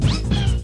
Exclaimation; MK64

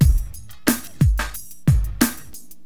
Drums loop, HipHop; LiveAct Pool

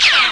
scratch

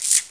chain Rattle; NETSTORM

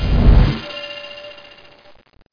SPELL; landslor

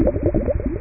bubbles; riptide

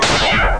Ship Laser; Apeiron

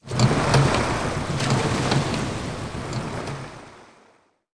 WATRPUMP, buildings

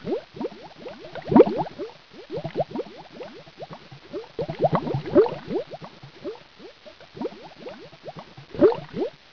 UNDERW

